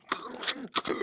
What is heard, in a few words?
spectre chest punch idle grunt; Titanfall 2